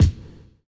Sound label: BD; Best Service; Dance Mega Drums